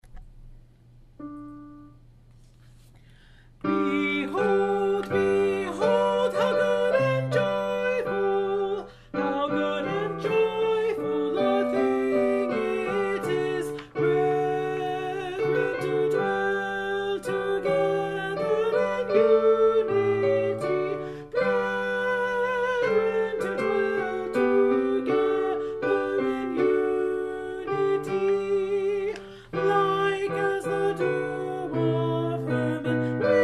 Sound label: Alto; Behold How Good and Joyful, J. Clark; Choral Resources; Alto practice